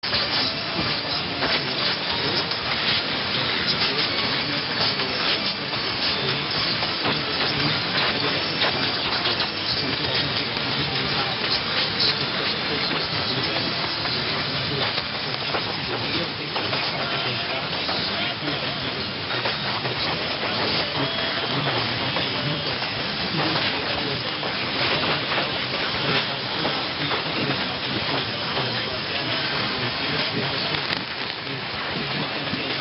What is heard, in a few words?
pips; Antena 1 Portugal; RNE5 Canary Islands